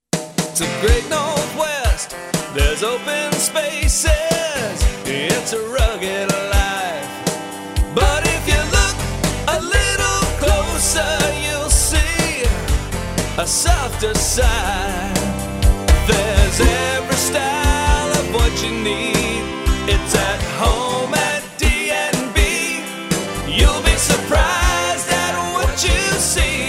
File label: Heartbeat Male